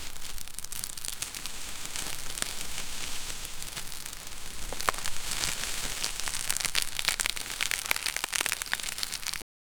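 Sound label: oversized crustacean creature, grass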